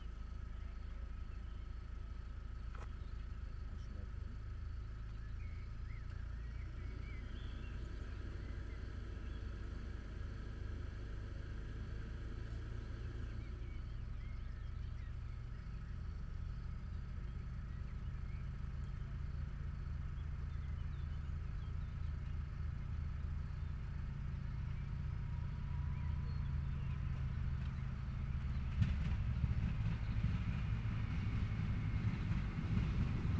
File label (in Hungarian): indulo vonat, atjaroban athalado auto; vizvarrol; cardioid